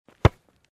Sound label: throw ball